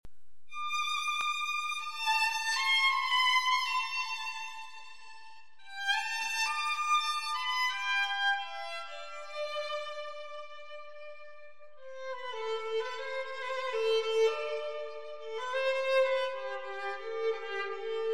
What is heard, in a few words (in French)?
Improvisation violon